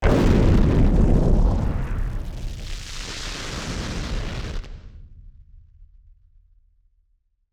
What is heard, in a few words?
Party Popper FX